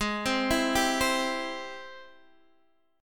G sharp Augmented Major 7th G sharp, down, Guitar, Drop D